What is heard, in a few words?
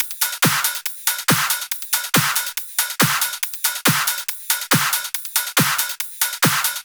Loops 140 BPM Hihat Percussion Loops